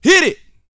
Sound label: VOX, Vocal, R&B Dance, HIP-HOP; Spectrasonics Vocal Planet